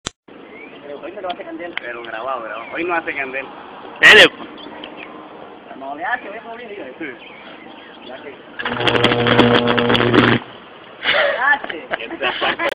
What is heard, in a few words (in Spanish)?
Arco electrico; Colombia